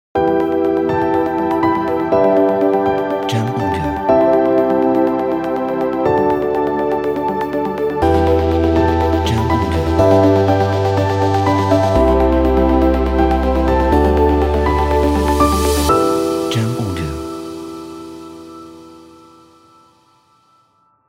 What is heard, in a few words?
Ambient Intro